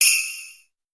Slay Bell; SN-R8-11 Metallic Percussion

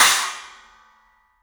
CYMBALS; 3D Sound; 3D Drums

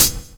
XV Hat C, Roland XV-5080

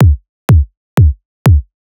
Strip 124bpm, House Drumloops

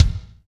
BD, BD REAL

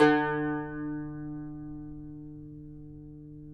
Cumbus D 3; Spectrasonics; Hans Zimmer Guitars VOL-2

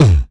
VOCDRUM, MOUTH; MOUTH PC

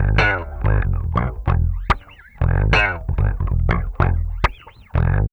BASS, Funky Ass Loops; East West

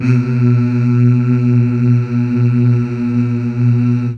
Mmh Male, CH BACK; Best Service Gigapack 1; CH BACK6

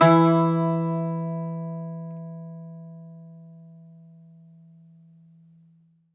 Greek Bazouki, Plucked Strings

